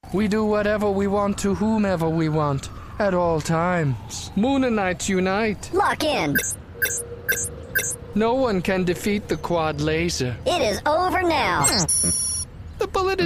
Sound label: quad laser